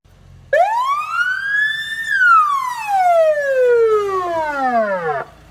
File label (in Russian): sirena gaz slujby